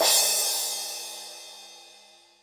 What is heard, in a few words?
Cymbal Drum Sample A# Key, Royality free cymbal tuned to the A# note. Loudest frequency: 6710Hz